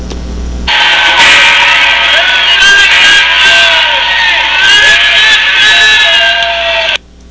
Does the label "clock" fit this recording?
Yes